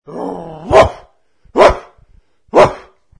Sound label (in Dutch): blaf